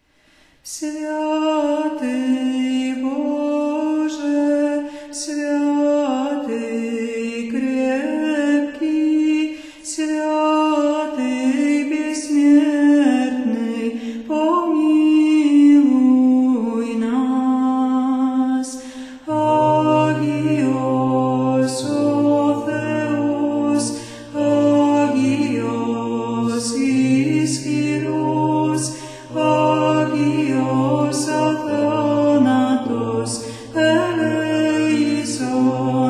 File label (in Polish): Choir Śpiewając z aniołami; Swiaty Boze; Zaleszany